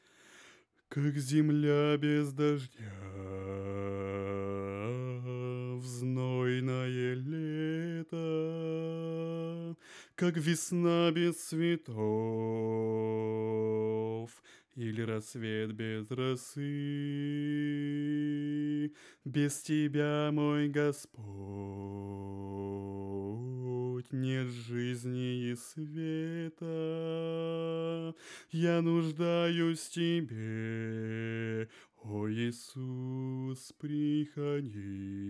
bass

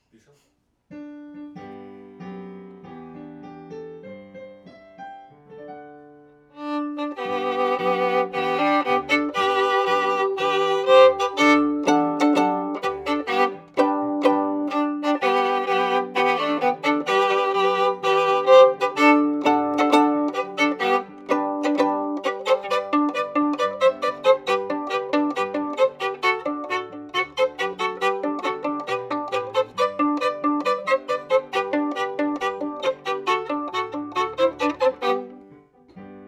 Viola C

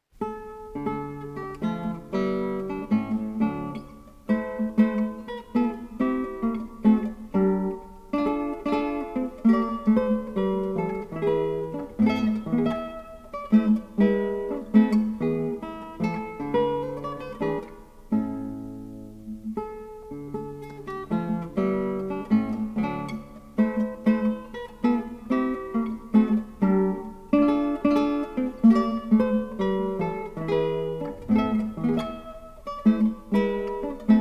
the baroque guitar, corrente; frescobaldi

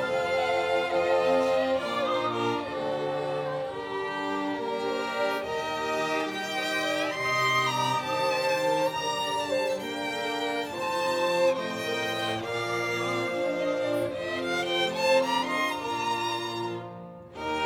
Overhead

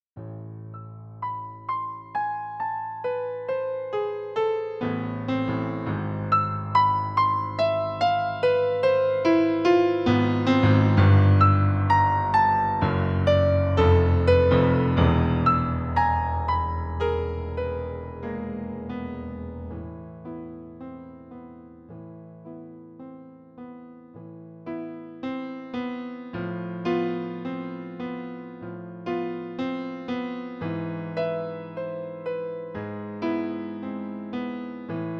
Piano

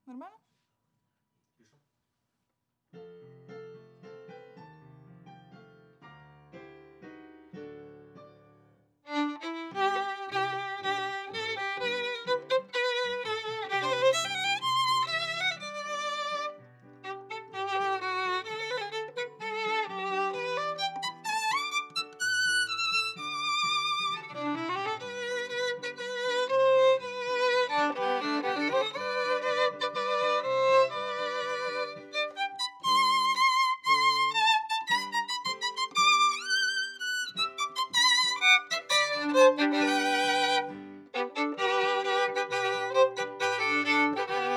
Viola D

